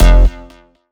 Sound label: Bass F, Bass Synth